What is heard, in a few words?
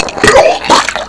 Vomit